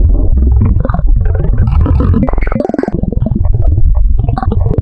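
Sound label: oscchip wavetable; Adventure Kid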